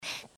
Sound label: breath; pony; Twilight Sparkle